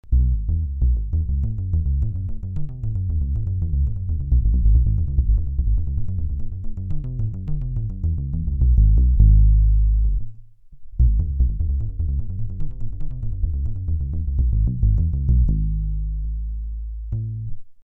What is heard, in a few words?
Tappings; Kala